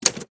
Typewriter Keystroke, Technology, Typewriter, Keystroke, Single; Smith, Corona; Typing Sound Effects